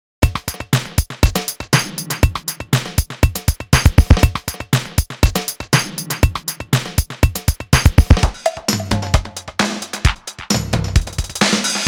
Drum Tests

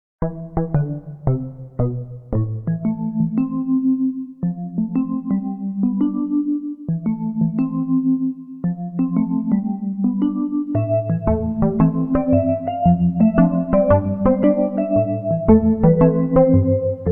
incidental

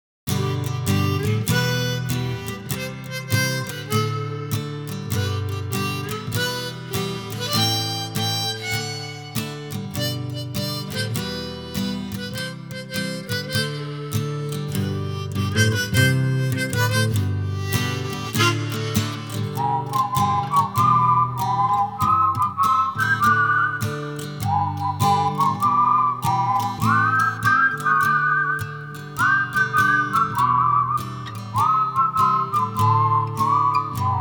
Campfire Fun Song; Saloon

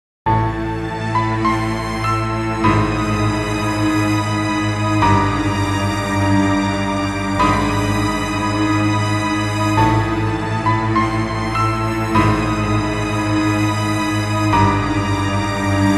Scary Music

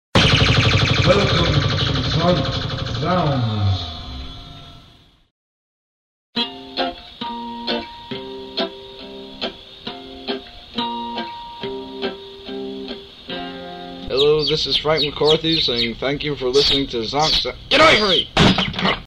intro outro

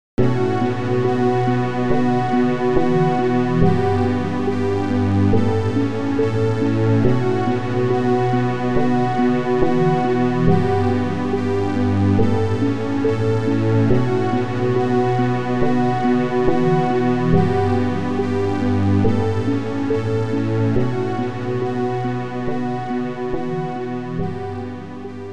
Casio Test

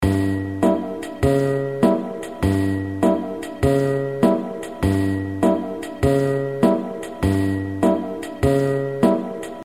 fade loop

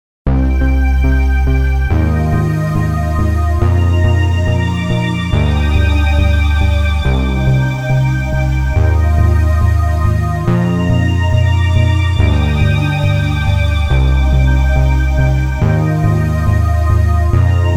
synth test

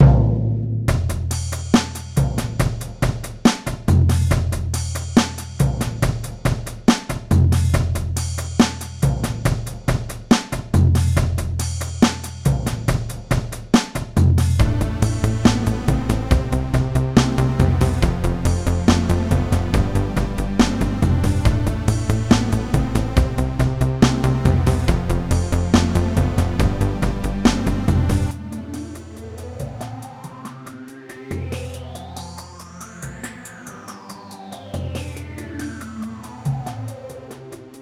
Drum Test

